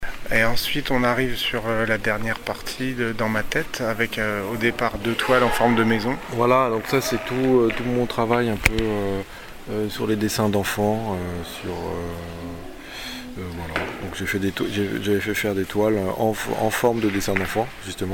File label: a cappella; toiles dessin enfants